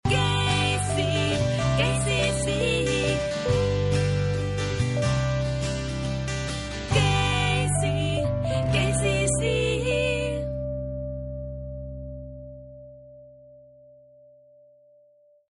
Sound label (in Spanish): Jingle Largo Sin voz; SUPERMERCADOS KEISY (Grupo FACSA)